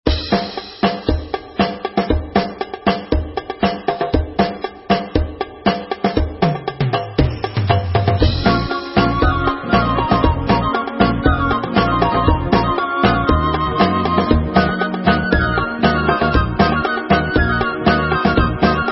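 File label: Instrumental, Israeli Dances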